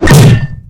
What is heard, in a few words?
tank hit small unpierce; tanks; War Thunder